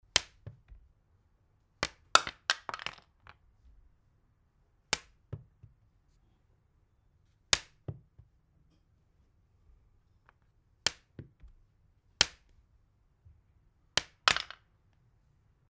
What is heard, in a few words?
stone glass hitting